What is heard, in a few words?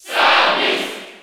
Cheer French; Samus; SSB4